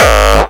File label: Bassdrums Hard, Hard Kicks